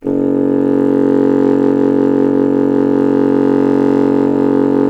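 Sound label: wind contrabassoon; Miroslav Vitous; Solo Instruments VOL-1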